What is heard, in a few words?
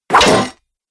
Mace Scuffs Chainmail; Battle Sound Effects